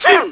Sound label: Merge Attack; Yuga wall-merging and attacking (both sounds heard at several pitches); Zelda